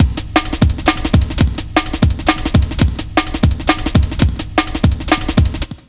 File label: LOOP, Jungle; USB Soundscan vol.05 - Explosive Jungle; AKAI